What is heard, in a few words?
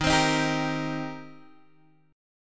Eb 6th Eb down Guitar Open G